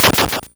GB Chop; SFX; SID & GB; ChipShop Deluxe; ChipShop Hits

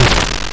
YM Noise; NOISES; ChipShop Deluxe; ChipShop Hits

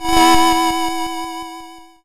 YM Chord E; ChipShop Deluxe; ChipShop Hits

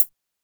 Closed hat, techno drum

